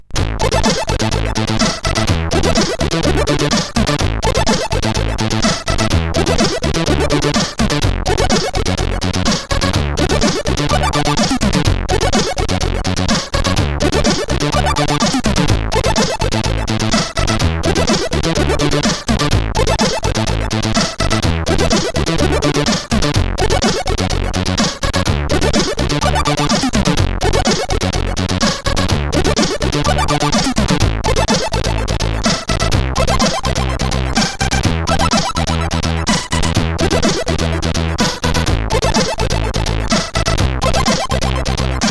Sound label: New Idea, CSG8580R5